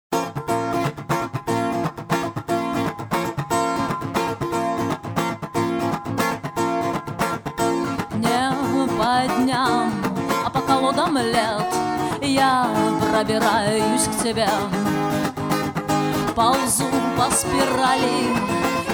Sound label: JAZZ